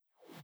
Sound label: sound Panel Close